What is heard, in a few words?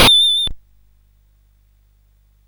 sid CSG8580R5